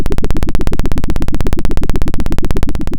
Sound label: pop, Sound of a helicopter